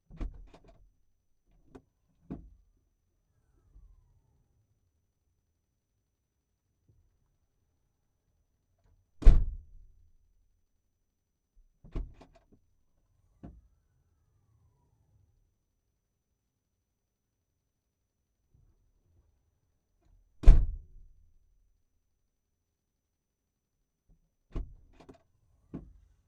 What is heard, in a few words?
VW Golf, Back Door, INTERIOR; R-Line 2018; MS Decoded